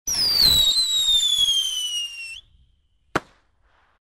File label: gun